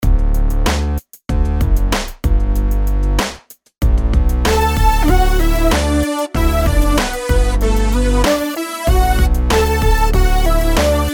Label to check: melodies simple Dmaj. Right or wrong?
Right